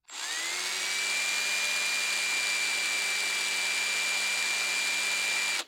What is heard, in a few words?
drill fast long; SoundsCrate